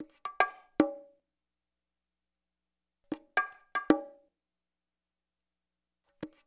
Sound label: percussion loop; InVision Interactive; Percussion Slam; MACHINE